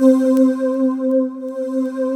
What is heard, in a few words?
C5, Synth Leads